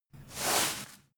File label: broom sweep soft